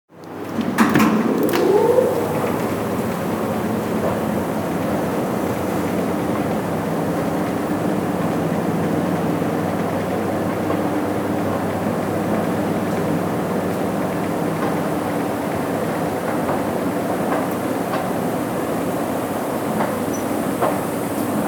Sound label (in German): Rolltreppe